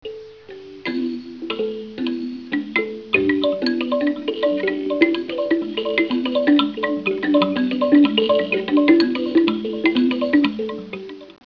mbira; Etnologia

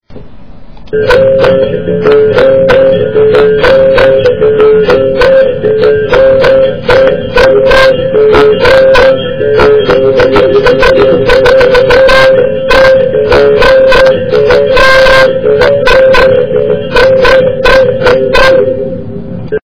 berimbeu, Africa musica; Etnologia

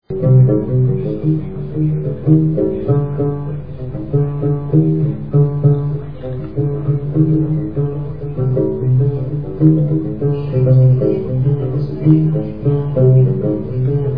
guembri, Africa musica; Etnologia

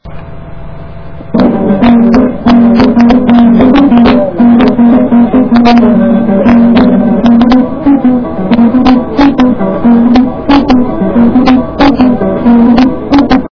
mvet; Etnologia

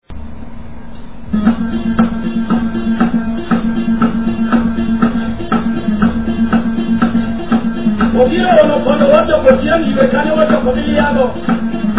nyatiti, Africa musica; Etnologia

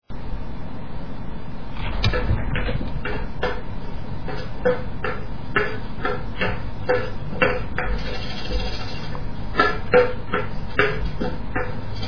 bagezege; Etnologia

